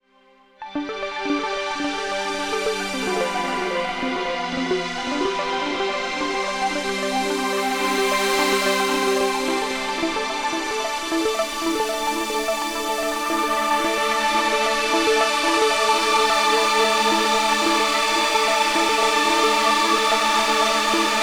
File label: Nord G2